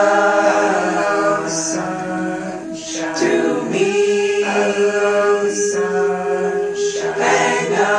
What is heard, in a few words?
sunshine vocals, Folksy